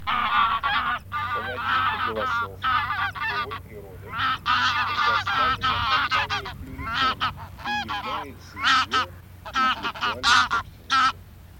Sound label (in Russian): Гусь серый базар Oche Selvatiche; plurifon